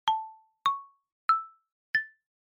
Chime Announce 4 Tone Slow Up

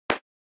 SNES Baseball Glove

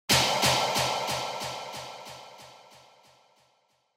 Impact, High Delay; Onoma

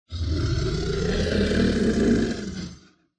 Monster

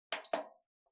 Kerosene Fan Heater Button

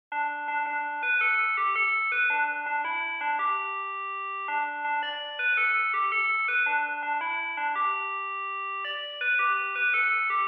Crosswalk Signal

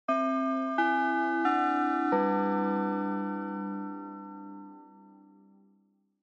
Japanese School Bell Fast Short

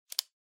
Ballpoint Pen Click

